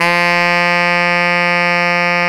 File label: SAX TENOR